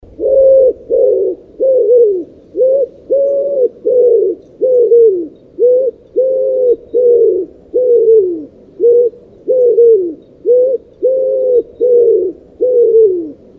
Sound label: vyahir columba palumbus